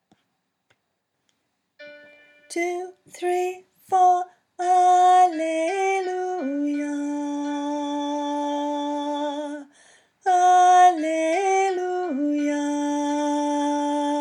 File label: high part